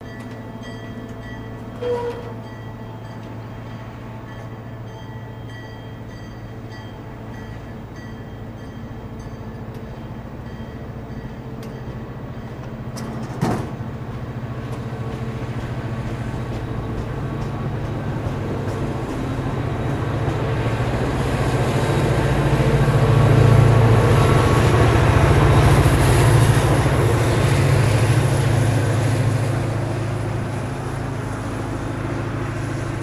Train Idles Awy Horns, bys

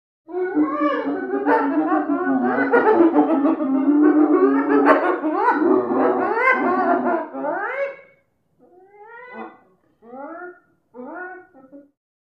Hyena Spotted Laugh

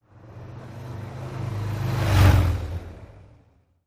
Harley driveby

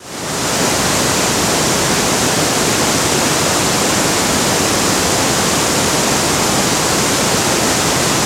Waterfall Lrg Loop Mo

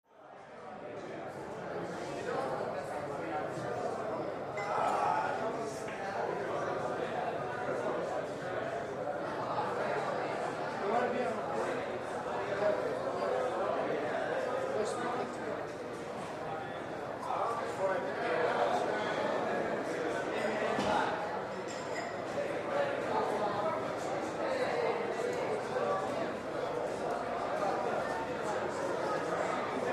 Mixed Group Bar Walla, bars & discos

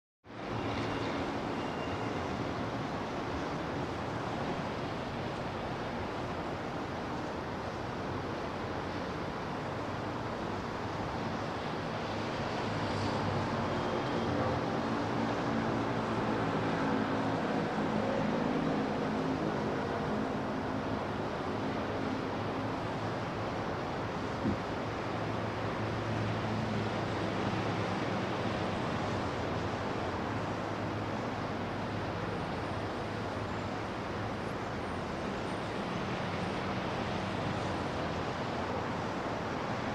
Traffic; Highway; Light Highway Traffic.

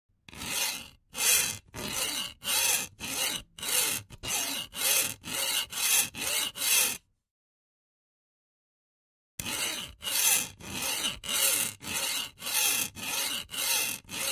Instruments Hacksaw, hacksaws

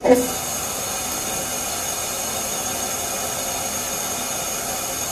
Water Fountain

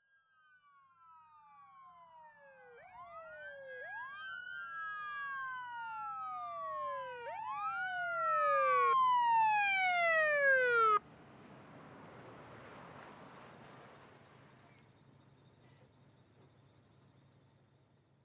Ambulance Fast wail

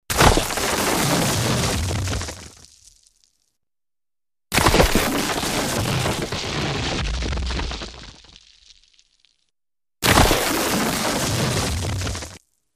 Big Rock Hits Slides; rocks

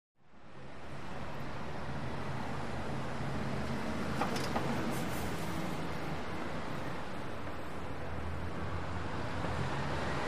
Bus In Stop Close Open

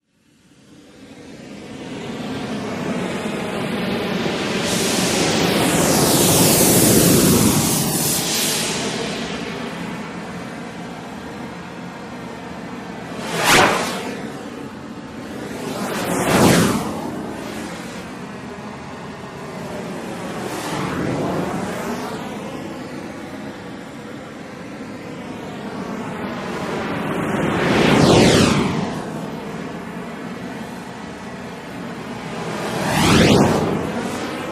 Acetylene Torch Bys With Hiss

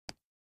Golf Club Hits Ball

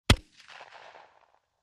Shldr Mount Missile R, missile exterior

